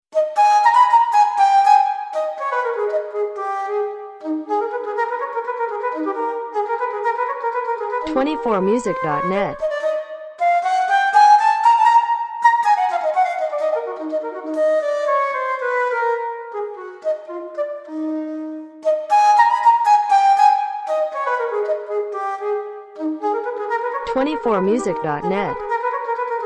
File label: Fantasia for transverse flute; telemann